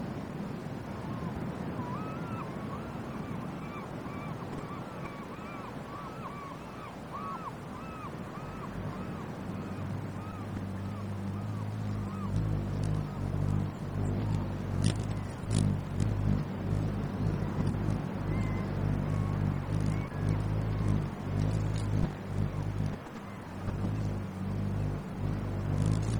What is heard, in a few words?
Large Insect on Windscreen With Gulls and Surf; INSECTS; Alaska